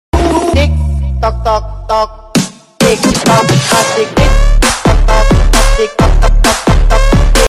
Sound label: Toktoktok remix; TikTok